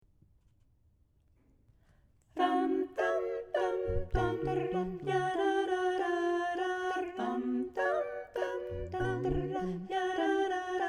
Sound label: Tango Exercise Tenor